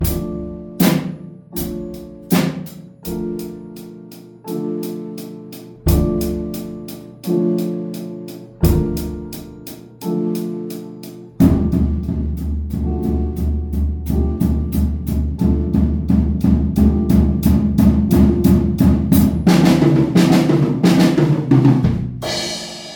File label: jam